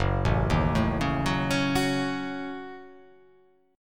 Gb Minor 6th, Gbm6 chord; arpeggiated; JGuitar

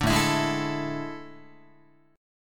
A Major 7th Suspended 4th, Guitar; AM7sus4; strummed

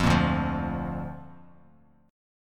D Minor Sharp 5th D down Bass Standard

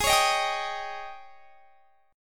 Ab Major 7th Suspended 4th Ab down; AbM7sus4 strummed

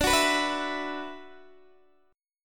D 6th Add 9th D, Guitar, Open D

